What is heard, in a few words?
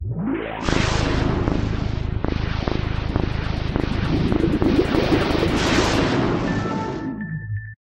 Xbox - Startup